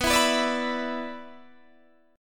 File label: B-Minor Double Flat 5th, Guitar DADGAD